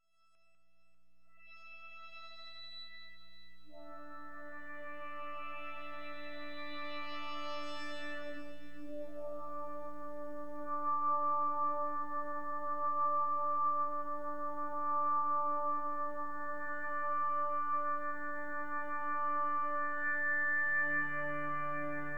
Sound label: drone